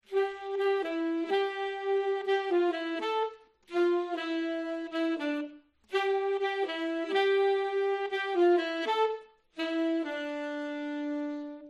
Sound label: Saxes D1